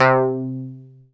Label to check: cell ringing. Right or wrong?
Wrong